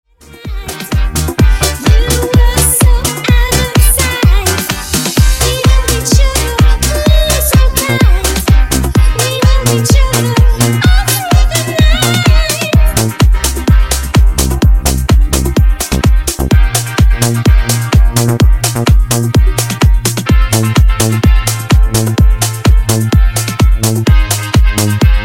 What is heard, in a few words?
More Funky 125Bpm